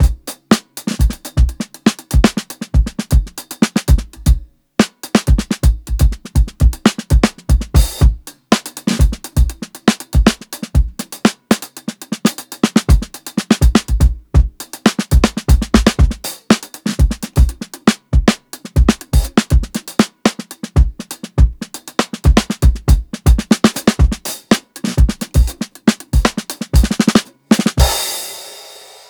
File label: 60 SYNCOPATED SOLO, drums; Basement drums vol 2